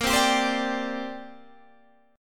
D 7th Suspended 4th Sharp 5th, chord

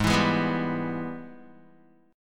Ab Minor Major 7th Sharp 5th, down, Guitar

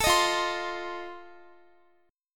F 7th F down Guitar; strummed

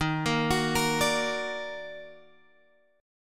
Eb Minor Major 7th Eb down Guitar Drop D, chord

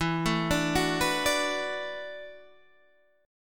E 9th Suspended 4th E down Guitar Open D

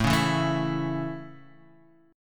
A Major, Guitar, Half Step Down

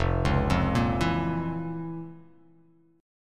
F Major 7th Suspended 4th Sharp 5th; 1,4,3,3,4,x,x,x; down; chord shape

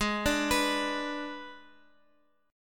G sharp Diminished, Guitar, Open F; arpeggiated; x,x,x,3,5,6

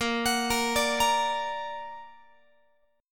Bb Augmented Bb Guitar; arpeggiated; x,18,21,20,18,21

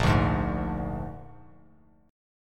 A sharp 7th A sharp, Bass Standard; A#7 strummed; JGuitar

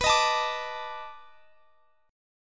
B 7th Sharp 9th B down Guitar Drop D

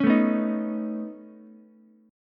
Db 7th Suspended 2nd Sharp 5th Db, down, Ukulele; strummed; JGuitar

